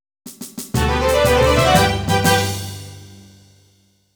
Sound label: Fanfare; Harvest Moon Back to Nature